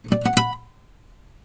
ag notification, acoustic guitar fx

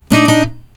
ag error, acoustic guitar fx

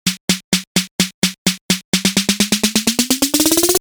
edm audio fill

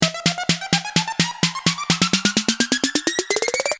edm riser

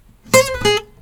ag alert, acoustic guitar fx

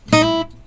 ag alert, acoustic guitar fx

